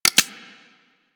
CY Xbow 2D Mech C; Tenno

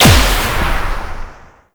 Energy Leech Explosion; Eximus

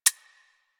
Tommy Mech Clip B, Weapons; Warframe; Tenno; Tommy Gun

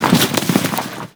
Roll Forward Foley; Warframe; Tenno

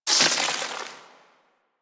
Melee Heavy Air Slam Impact Ice; Weapons; Whooshes; Warframe

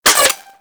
Scope Gun Mech; Crp Scope Gun

